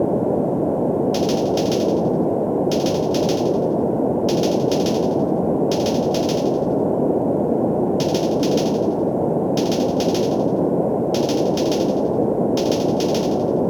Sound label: Elevator music